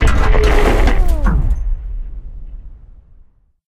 Jukebox Destroyed

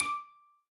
Combo Sound; Candy Crush Soda

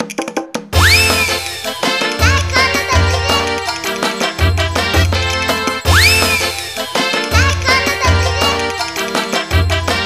Title Taiko; Taiko no Tatsujin Portable 2; PSP

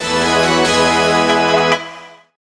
Jingle Battle Win, Stereo; Monokage Quest; 3DS

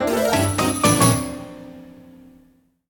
Stop; Super Mario Party Jamboree

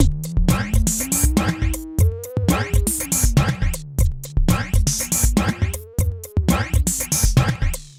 Electro

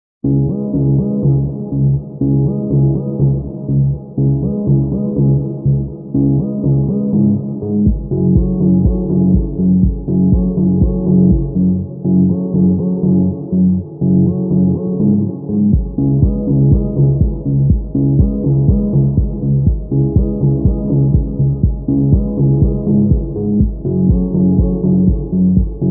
Euclidean Waves Muffled Loop; Neo Cab; iOS, MacOS, Switch, Windows

